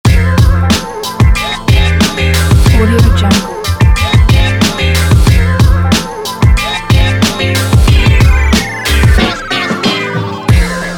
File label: laid back hiphop logo